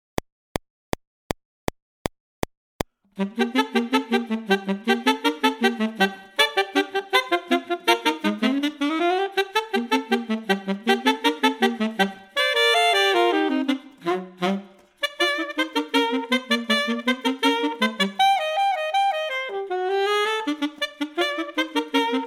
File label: Alto Duet